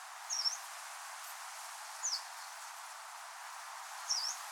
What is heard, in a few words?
Yellow browed Warbler Phylloscopus inornatus; Ladakh